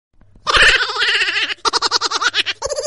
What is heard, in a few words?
laughing notification funny